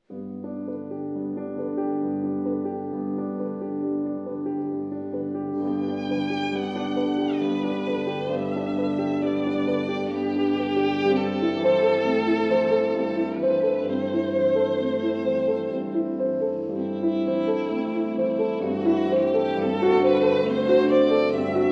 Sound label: The Swan (Arr. For Violin and Piano); The Carnival of the Animals, R. 125 XIII.